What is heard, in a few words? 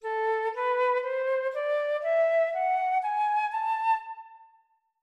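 melodic minor A4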